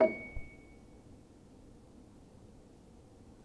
Player, Upright Piano; healing soundscapes; HSS OP Pack

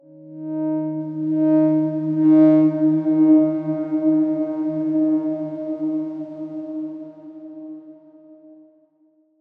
Darkswarm D#3 pp; HSS Ableton Pack1

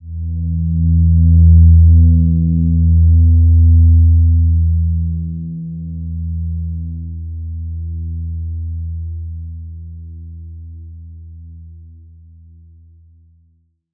Silver Gem E2, healing soundscapes

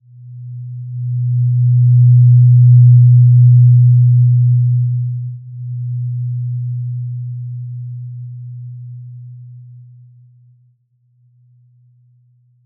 Simple Glow B2 mf; HSS-Custom-1; healing soundscapes

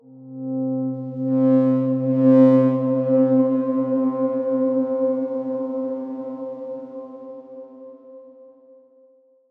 Darkswarm C5 f; HSS Ableton Pack1

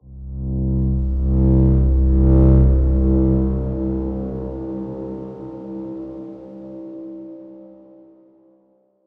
Darkswarm C1 ff; HSS Ableton Pack1

